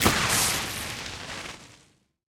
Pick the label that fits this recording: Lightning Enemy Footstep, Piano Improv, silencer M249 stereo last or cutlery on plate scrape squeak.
Lightning Enemy Footstep